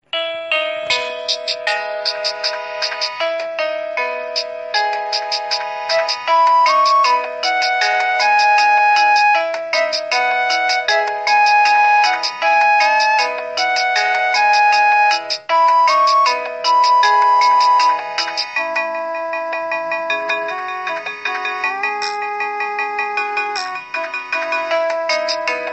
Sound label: nokia 2600 mobile tone; nokia lestine